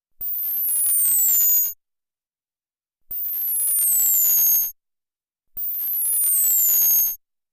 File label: Blip Tone Longer